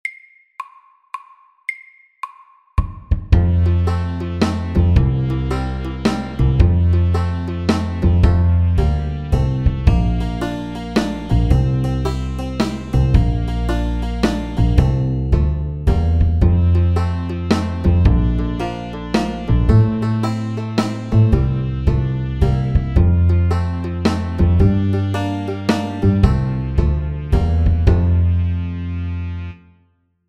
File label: Country shuffle MIDDELS